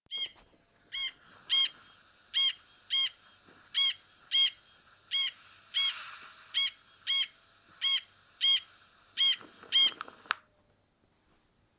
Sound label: Female Corncrake